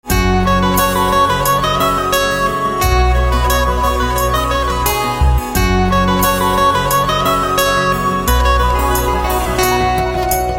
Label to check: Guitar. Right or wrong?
Right